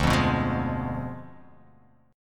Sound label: D Diminished 7th D